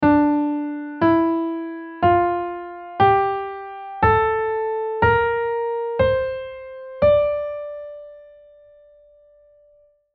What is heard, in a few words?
D Natural Minor